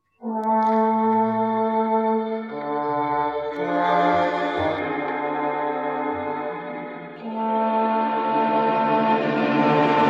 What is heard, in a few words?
Trombone